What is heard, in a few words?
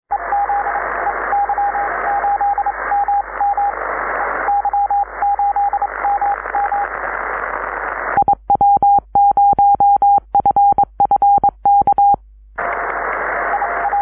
cw; ey8mm; 6m